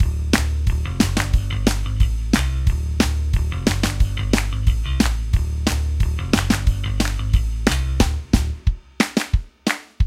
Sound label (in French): Musique Whack; farmer against potatoes idle